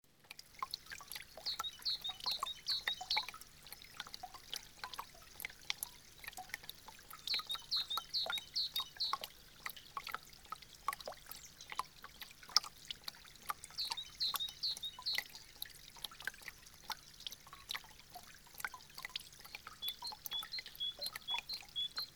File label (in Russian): zvuki prirody kapel i ptitsy; vesennii sbornik